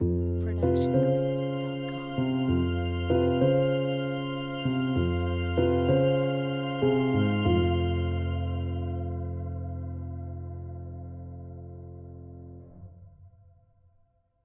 Mystery Piano; soundscrate

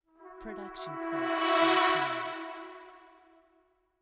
high brass flutter; soundscrate

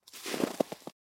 Snow Walking Single Step; SoundsCrate

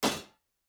Metal Footfall; soundscrate

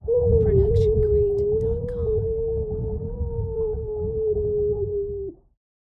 underwater scream female long; soundscrate

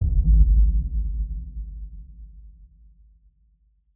sub shockwave; soundscrate

